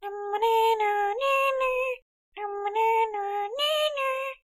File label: Sound Birthday